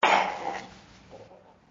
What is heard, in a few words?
Choke; Lewd SFX